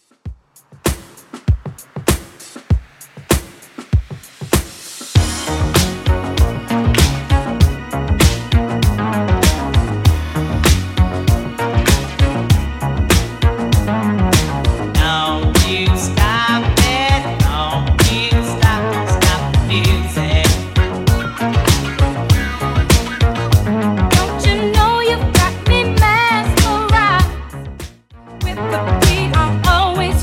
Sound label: 80s Redrum 98bpm